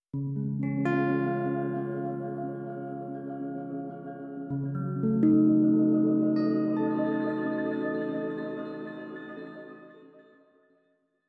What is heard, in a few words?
Clair de Lune, Piano FX